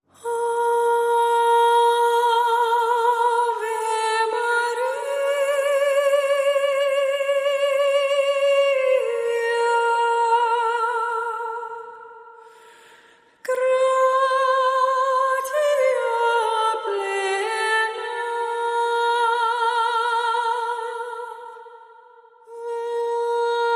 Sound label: Ave Maria Horror, Vocals Only